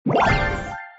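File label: X10 destroy; slotNTigerbundle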